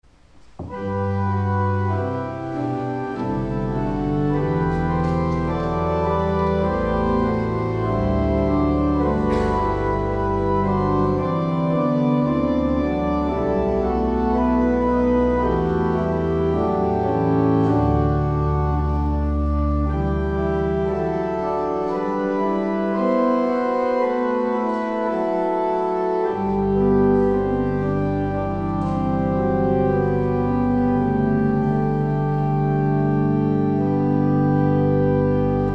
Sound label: Organ